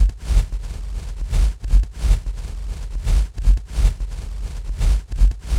VELVT SCRAP; Distored Reality

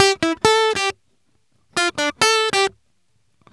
MERENGUE, World Grooves

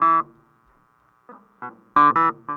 clavinet, analog funk

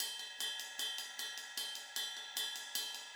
POP RID, Pop-Rock Drumloops; 152 POP

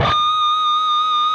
PRS C 4, PINCH, Guitars; Spectrasonics; Hans Zimmer Guitars VOL-1

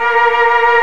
Barton organ; Barton Melo L+R

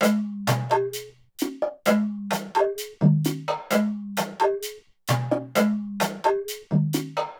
World, Percussion Loops

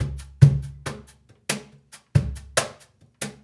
Cajun, Percussion, Drums; Essential Percussion

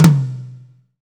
tom, Pop Country, Wet

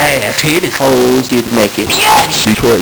Nintendo Gameboy LSDJ; Ghetto

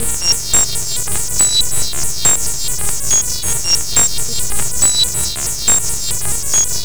Synth Distorted; Electrocution

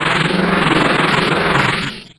weird; Best Service - XXL Production Effects

